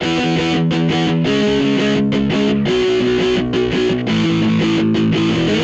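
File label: Guitar Punk E major 170bpm; Guitar and Bass; Ableton; Factory Packs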